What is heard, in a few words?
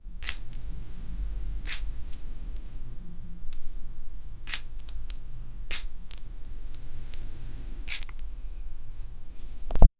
cuttig sound; impacts